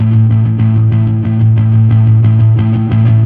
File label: Fuzz A harm; Torchlight III; PS4